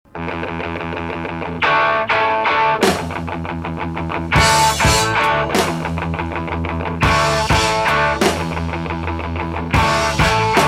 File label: music